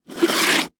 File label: Foley Footstep Hard Sole Dress Shoe Scrape On Cement Fast; Foley Footsteps